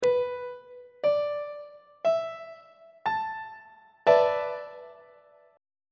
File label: f flat dominant 7th suspended 4th 2nd inversion; on treble clef